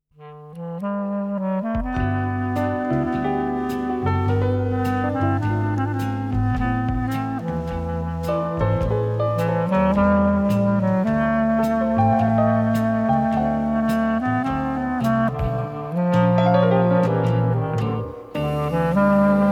Clarinette D Amour

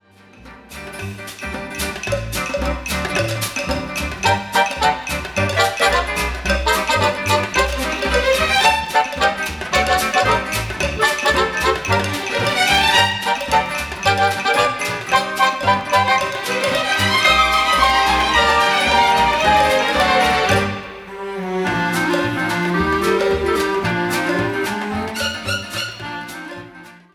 Orchestra

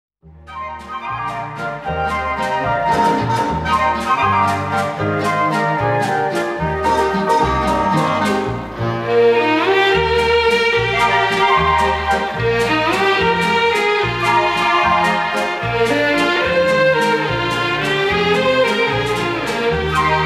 Waltz, Orch

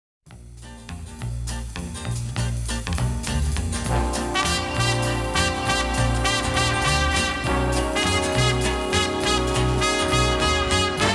Waltz

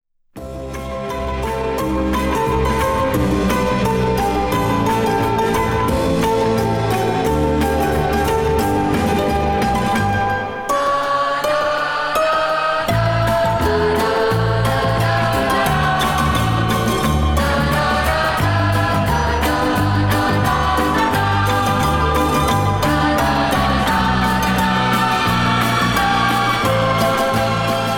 Orchestrion, Orchestra

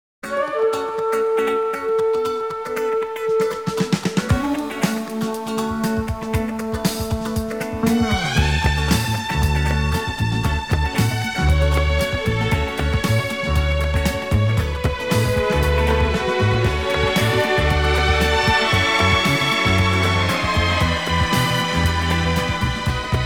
Strings

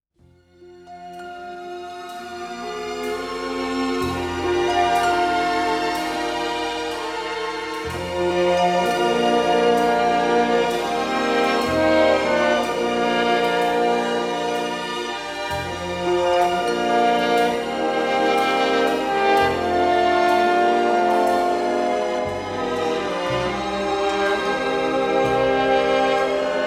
Orchestra

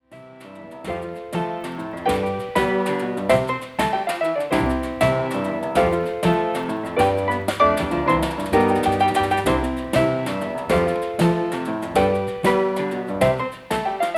The Piano Quartet

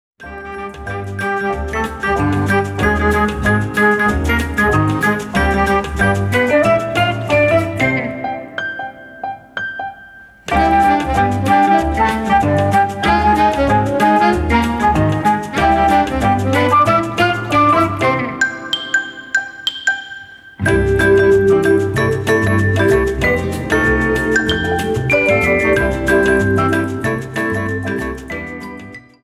Band

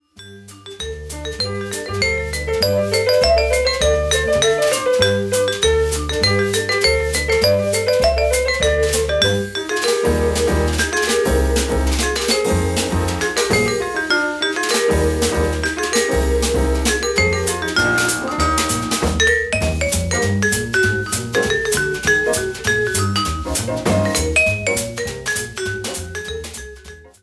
Jazz Quartet

